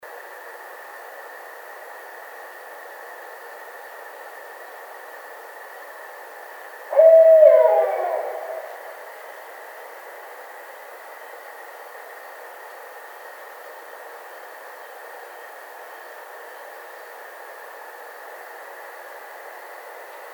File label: Barred Owl